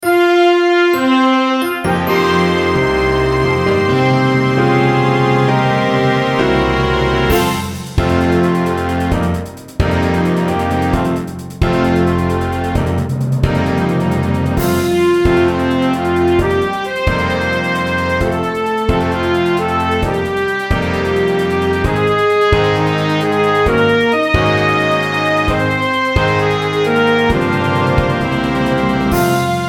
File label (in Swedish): jubileumsfanfar